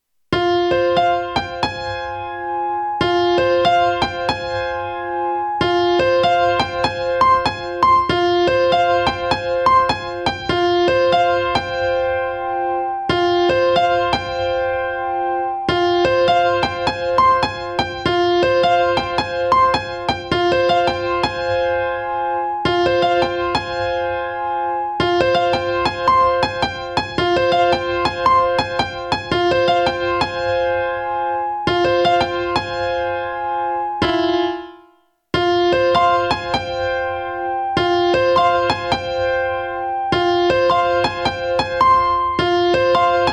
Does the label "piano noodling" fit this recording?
Yes